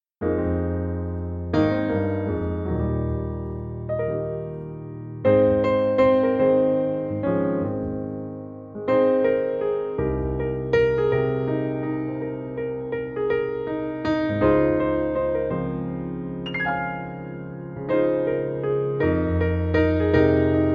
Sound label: Piano Version